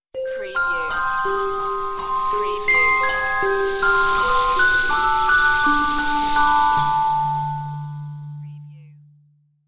Scifi Audio Logo Ident,Tribal Bells,Metallic,Sweep